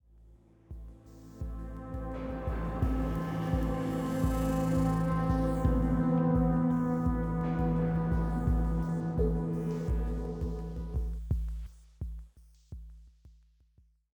Military High 85bpm

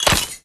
Ice Removed

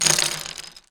Obstacle Destruction; game rip; lego ninjago rise of the snakes

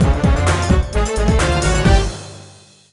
Sting End Mission; monsters vs aliens; nds; Missinglink

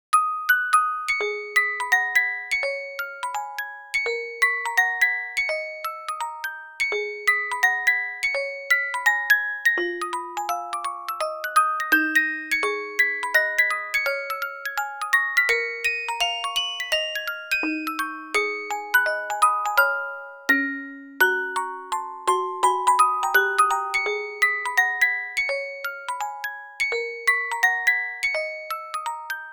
Music Box Version; Super Mario Odyssey

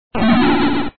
Recover

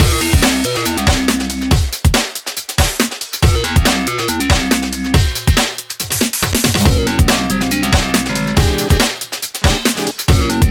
Fanatic Funk; Bike Shop

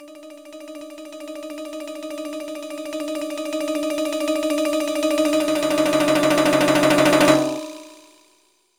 Boss Intro; mario vs. donkey kong mini land mayhem

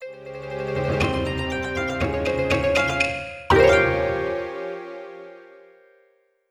Jingle, Game Over; Sakura Samurai Art of the Sword; Wii

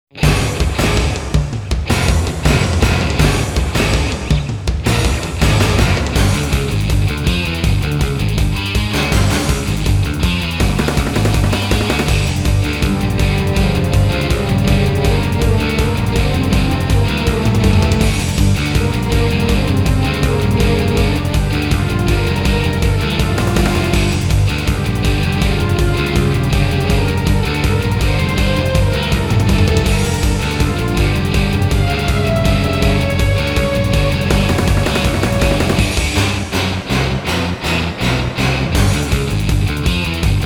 Countdown; Shin Megami Tensei Devil Survivor 2 original soundtrack

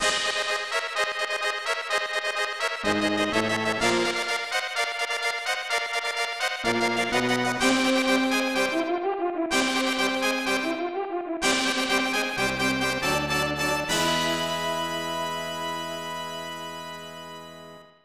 Fanfare

